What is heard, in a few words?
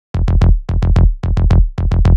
110 Fm Psy Chilled, Melodic Loops; Electronisounds